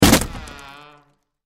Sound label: Impact Door Break Down Wood Hit Hinge Squeak; Blastwave